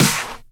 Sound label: Snares; Vengeance - Essential Clubsounds Vol.4